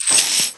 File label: chains, movement; lifestealer